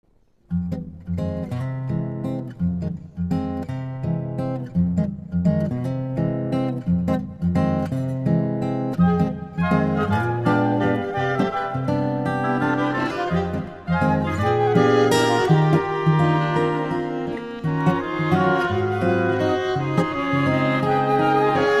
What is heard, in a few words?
a guitar's rythm; Napple Tale: Arsia in Daydream